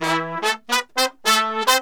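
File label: brass 110 A minor, brass murga; Best Service ProSamples vol.14; World Grooves; AKAI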